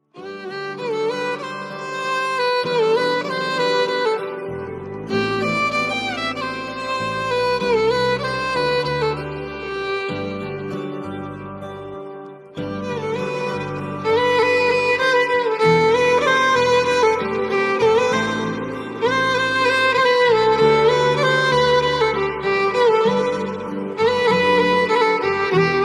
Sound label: bgm telugu calming music; hi nanna; ringtone